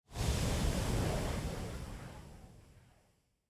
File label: lava; factorio